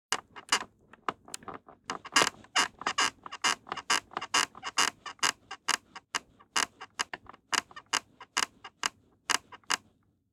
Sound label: creaks, household; NS Studios - ultra sound collection